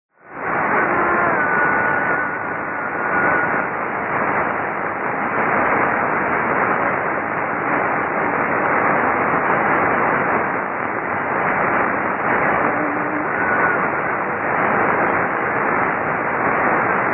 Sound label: 9305kHz Radio; Radio Cairo